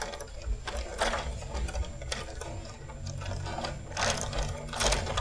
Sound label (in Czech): drap; Mafie 1